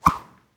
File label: Small Whip